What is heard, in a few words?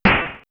enemy dead; SNES; SMRPG